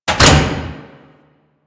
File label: Infested Metal Clunk; Ambience; Props; Warframe; Corpus Infested